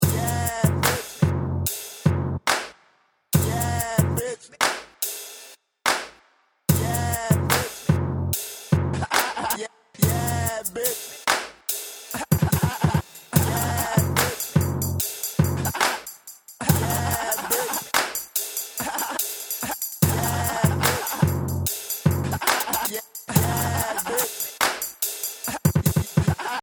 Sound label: ringtone